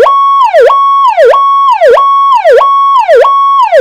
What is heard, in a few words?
gen 2 warble; SHO-ME SIREN WITH REMOTE MINI-CONTROLLER; Siren Tones